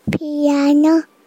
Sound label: piano